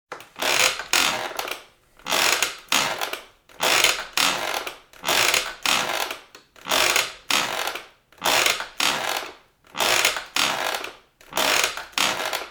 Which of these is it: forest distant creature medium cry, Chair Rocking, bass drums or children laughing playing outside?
Chair Rocking